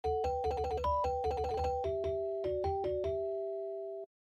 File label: Metal Riff